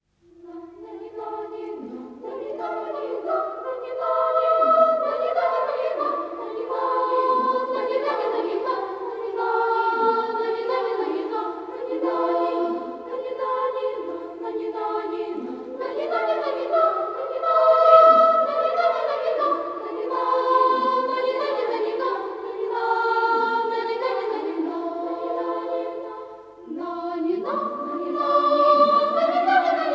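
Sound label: large children's choir; russkaya klassika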